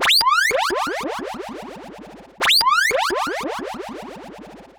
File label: fx; Google; NewWave Labs